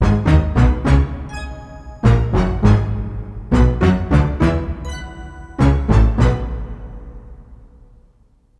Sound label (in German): Orchester